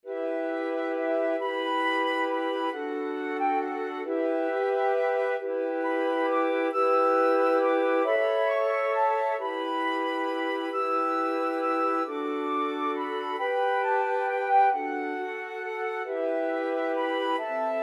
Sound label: scarborough fair, flute quartet